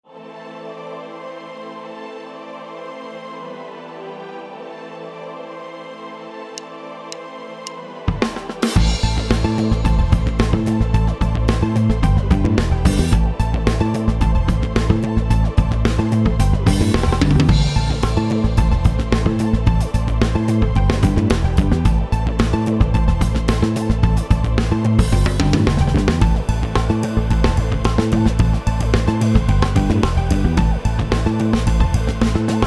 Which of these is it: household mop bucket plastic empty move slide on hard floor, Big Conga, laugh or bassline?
bassline